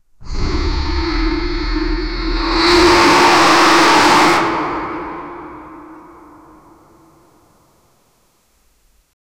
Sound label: a loud deep intimidating, dragon